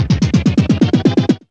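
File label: percussion sequence; E-Lab; X Static Goldmine 1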